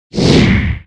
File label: ATTACK